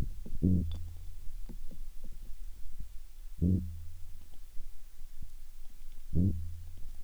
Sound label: Pygocentrus piraya Sound, fish; San Francisco piranha